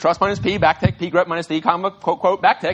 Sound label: beep